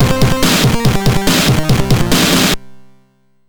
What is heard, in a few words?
Error; Breakout Error Virus; MOS6581R3